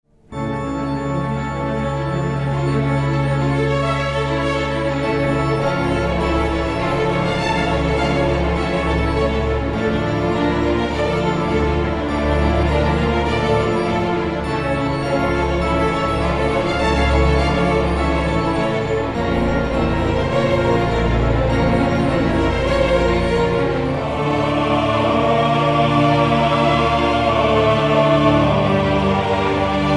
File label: Organ; Waycrest Manor